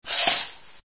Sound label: musica recycle; windows 9x; sound schemes